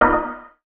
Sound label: chords